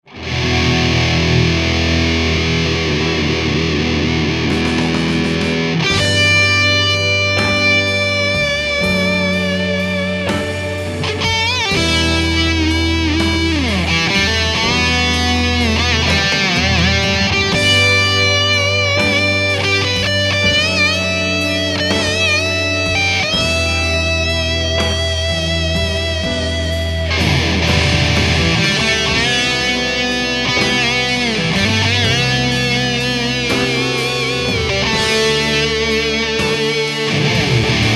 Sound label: solo